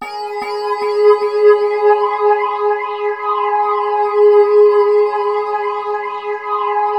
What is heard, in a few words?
digital FX; Sound Gallery - Charged